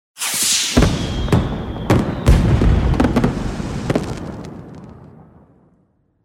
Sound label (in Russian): odin rassypaetsja na neskolko; Звук салюта; Звук фейерверка; звук салюта победы